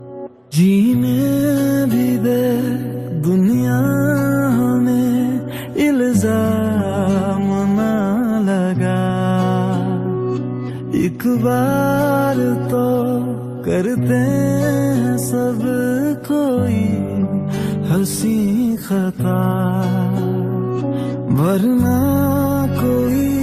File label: Sad Ringtone